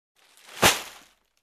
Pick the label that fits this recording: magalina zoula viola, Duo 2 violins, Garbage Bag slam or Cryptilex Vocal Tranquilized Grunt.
Garbage Bag slam